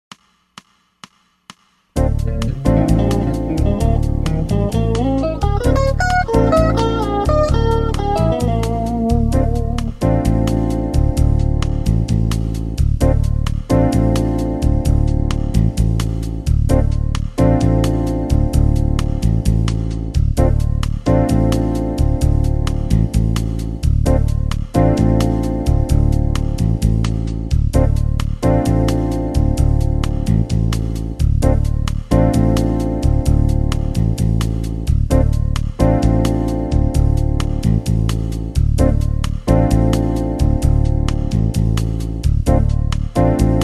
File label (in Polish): podklad do cwiczen improwizacji